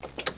SWITCH; WAV Sound Effects; houshold; utility

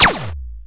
LASER; PC World Komputer 1998 May; rrdemo

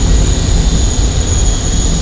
ENGINES

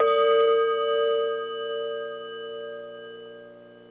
gong; hotjava demo

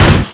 crash; PC Gamer IT CD 31; SegaTC

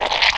attack; allods2d

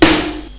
snaredrum; BANG; 19,040 sample rate

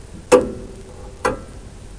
Tic Tac beep; Short Miscellaneous

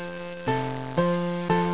Sea Cliff; mer; Question

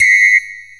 whistle; Real Oldies2

